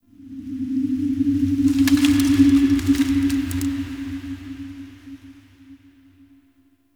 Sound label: Zariman Park Wind; Warframe; The New War; Ambience